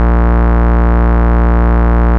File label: Vengeance Ultimate Bass A# Root A#